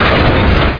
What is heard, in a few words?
CRASH; PC Gamer IT CD 35